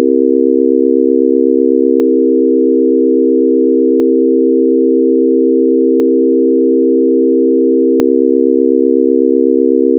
mystery buzz, periodicity buzz tests - with chords